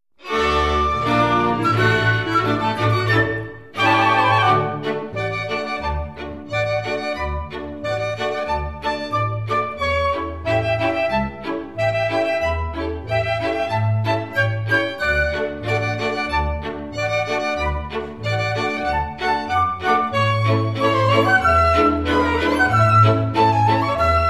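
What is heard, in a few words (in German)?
Historische Flötentöne Marsch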